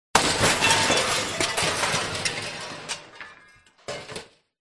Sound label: crashes junk various; Audio Mangler